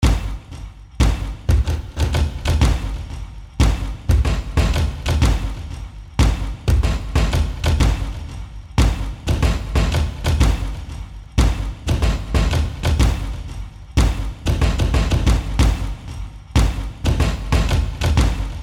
action drums

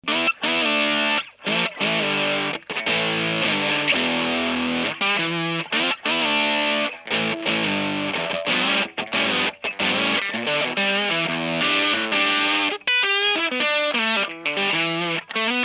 Fuzz; Fuzz God; Sputter 100

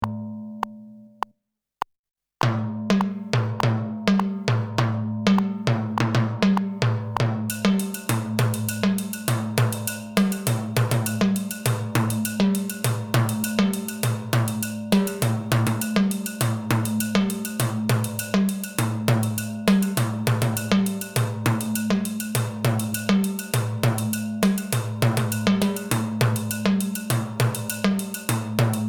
LINE PERCUSSION

